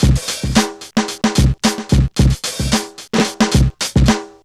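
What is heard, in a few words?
111 bpm, Funky loops; Zero-G; Funky Elements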